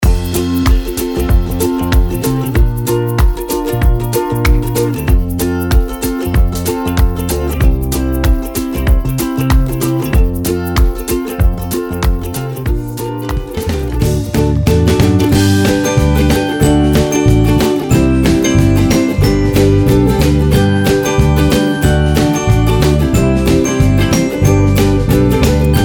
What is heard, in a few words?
Upbeat Ukulele Background Music